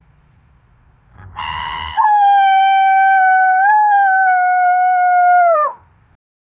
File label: a combination of bear and, animal vocalizations